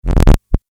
vinyl rubbing

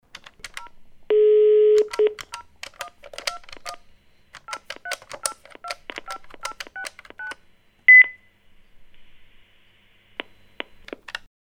phoning sounds seq

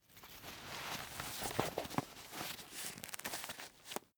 umbrella telescopic close velcro

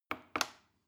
plastic button small click regular slow

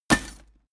impact chain leather

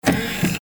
door lock

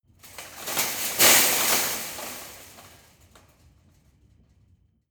land metal fence

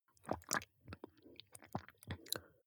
drink glass milk swallow small

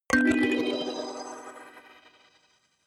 notification kalimba magical third

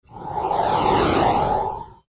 airy smooth phase

